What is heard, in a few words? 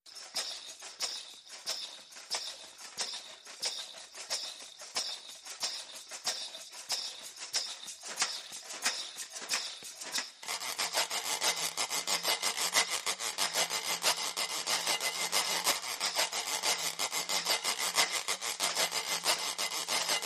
Swampy Groove, Small Perc Only